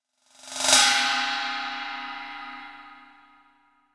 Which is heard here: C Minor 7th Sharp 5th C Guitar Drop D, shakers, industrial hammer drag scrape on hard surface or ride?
ride